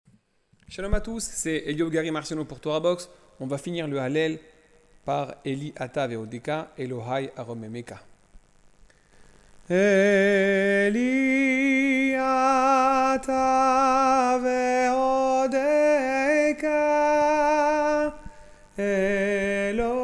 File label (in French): hallel ashkenaze; eli ata; tefila